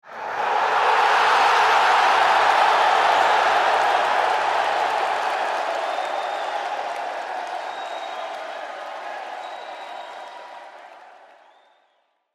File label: crowd Reaction arena big Reveal; spellbound